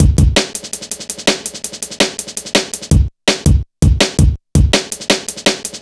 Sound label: 165, Drum Loops, Drum & Bass; Total Drum&Bass